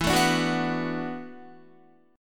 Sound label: E 9th Suspended 4th E down Guitar; strummed; chord; JGuitar